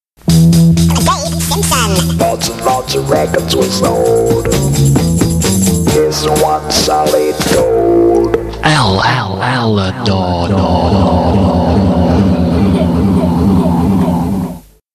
Jingles

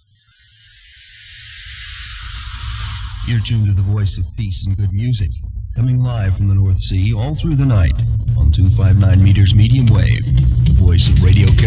Radio Caroline Jingle Peace And Good Music

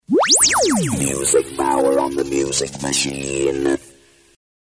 Music Power on the Music Machine; JINGLES

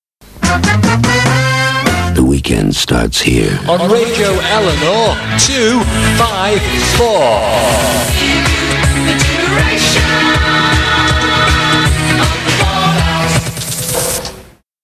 Jingles

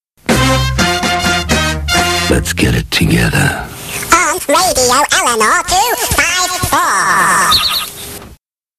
Jingles

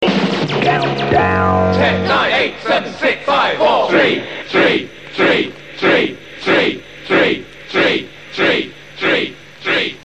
Countdown Jingles

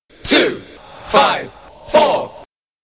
Jingles

